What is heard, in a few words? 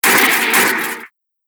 Dark Horror Hits; impact; Miscellaneous Impact Sound Effects; Impact / Explosion Sound Effect; Andrew Fleming